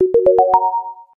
Realme C53 Notification

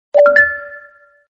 Realme GT5 Notification

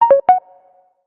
Realme Pad 2 Notification

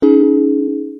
Notification Ringtone; Vivo V29 Pro

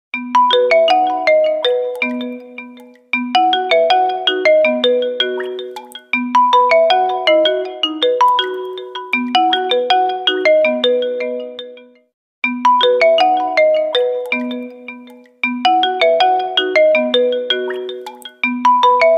Vivo Y36I Ringtone

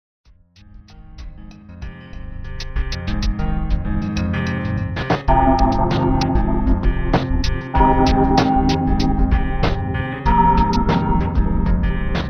Vivo Ringtone; Neo9

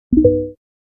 Charging Sound; ColorOS 6; Realme UI

